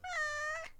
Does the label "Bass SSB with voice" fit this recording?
No